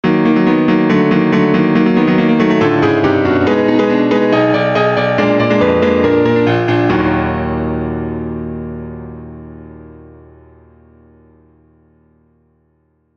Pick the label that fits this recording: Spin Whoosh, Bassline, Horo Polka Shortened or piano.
piano